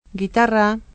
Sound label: GITARRA